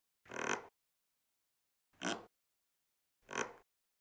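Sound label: floor creak sound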